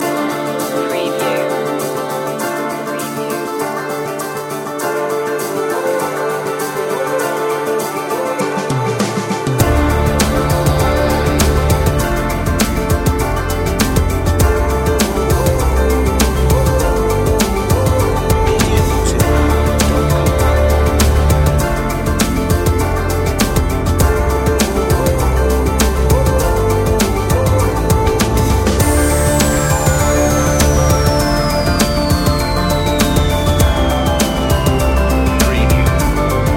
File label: tribal trip hop